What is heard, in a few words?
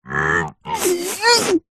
human male voice grunt groan lift heavy weight; zapsplat